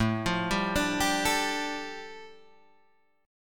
A 7th Suspended 4th Sharp 5th A Guitar Half Step Down, chord